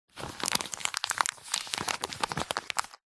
money 20 dollar polymer note light handling movement